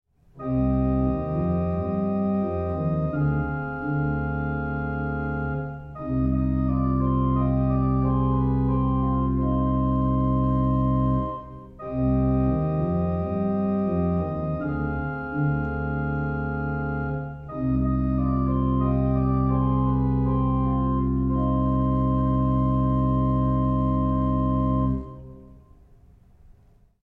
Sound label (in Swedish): Svensk folkmelodi, Orgel; Orgelskola I